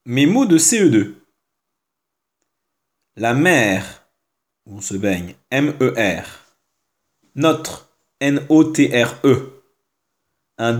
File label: Vocal